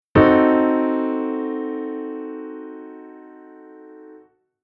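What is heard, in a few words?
Chord diminished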